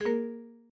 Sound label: device removed; sound-theme-freedesktop